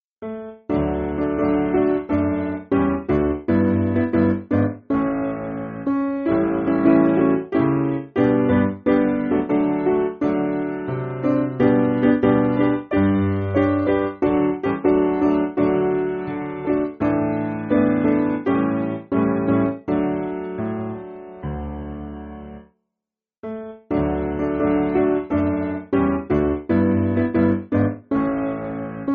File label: Piano, Backing Files for Churches